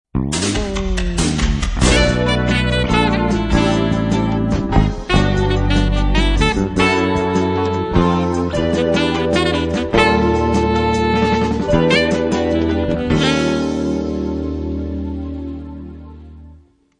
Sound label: free melo